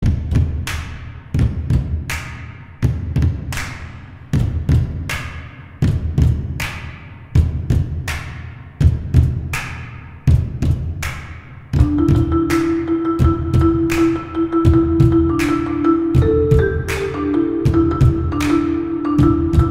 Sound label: Marimba